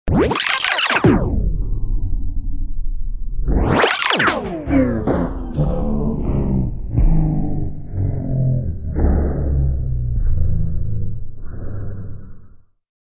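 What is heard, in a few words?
Scrolling with slowing down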